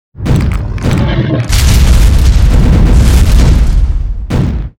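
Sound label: arcane golem attack, monster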